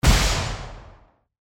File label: ar15 fire; garrysmod